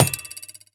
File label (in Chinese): 三叉戟地面冲击声